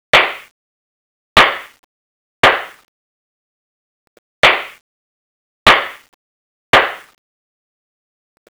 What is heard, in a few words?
board